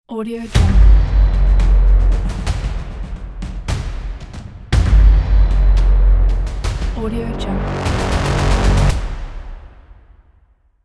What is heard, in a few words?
teaser reveal logo